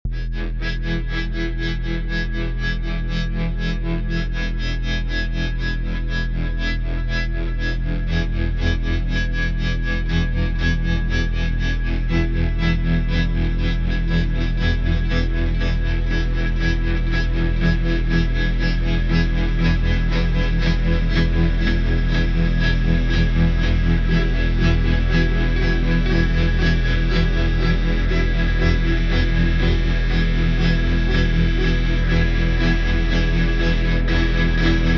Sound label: Electrical Cello Blues Drone; Kyiv